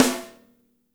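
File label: piccolo snare right, NATURAL KIT; Spectrasonics; Burning Grooves CD2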